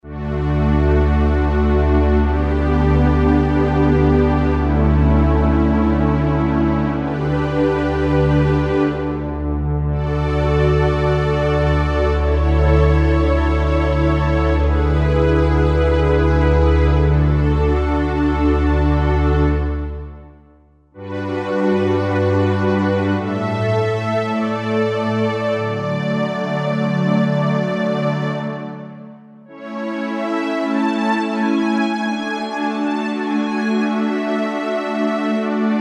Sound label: JP-8 Str 3, Roland JV-2080